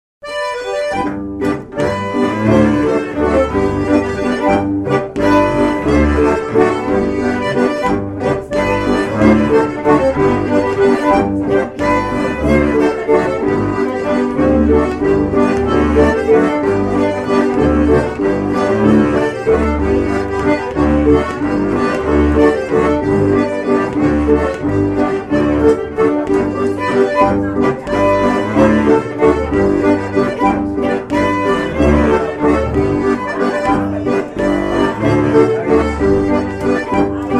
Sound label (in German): Zwiefache; Zwiefacher; Giersberg Sep2010